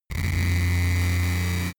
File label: engine small overload electric; energie